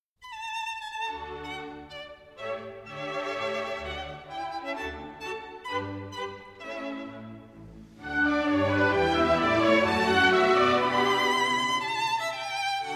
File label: Violin Concerto No. 4 in D Major III. Rondeau. Andante Grazioso; Mozart